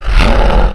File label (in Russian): udar